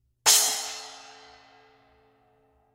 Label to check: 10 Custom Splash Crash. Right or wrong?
Right